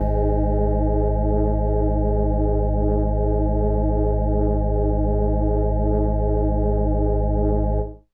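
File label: Indie Organ Kawaii G#1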